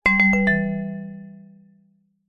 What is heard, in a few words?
multimedia alert chime short musical notification error; zapsplat